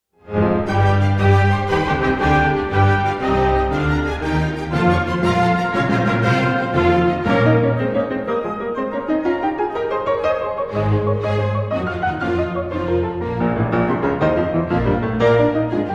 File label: rondo brillant in e flat major op 29 for piano and orchestra